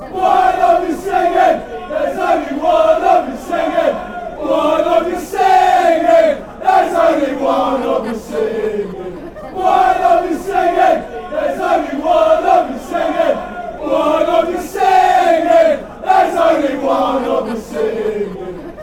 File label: only you singing, fanchants